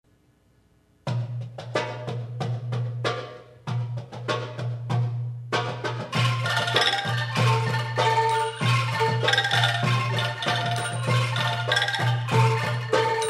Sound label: Angklung